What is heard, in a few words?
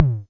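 mattel tom, Mattel Synsonics drum kit, lo fi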